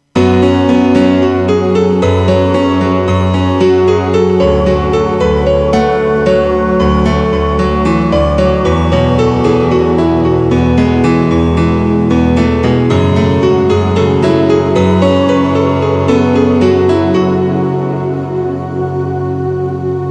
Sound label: delay piano